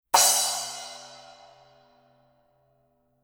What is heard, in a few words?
color sound 900 12 black splash edge, paiste